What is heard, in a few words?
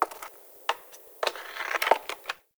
Tree Pine Branch Break; Ambience; Trees